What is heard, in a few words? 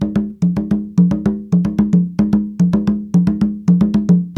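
110 bpm, Junkanoo; Spectrasonics - Supreme Beats - World Dance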